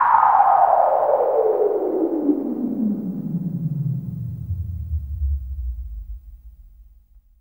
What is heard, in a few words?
Downlifter